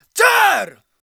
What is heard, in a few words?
Battle Cry, Viking Vocal, Un-Processed